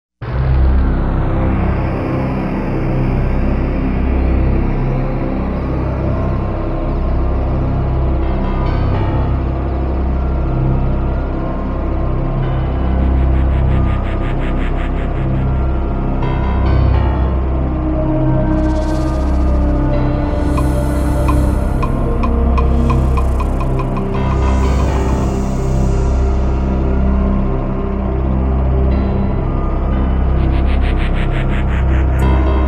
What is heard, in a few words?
Background Music; Mech2OST